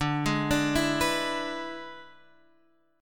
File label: E 7th D, guitar